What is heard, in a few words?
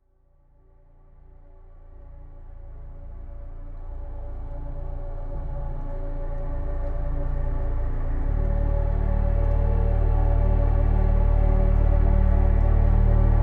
slowed + reverb